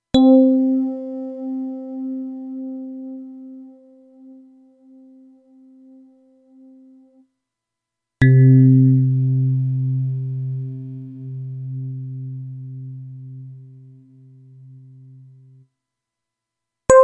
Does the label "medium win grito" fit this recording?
No